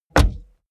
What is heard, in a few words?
car bmw e46 exterior door rear close; Cars